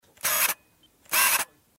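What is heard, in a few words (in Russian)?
zoom kamery, camera zoom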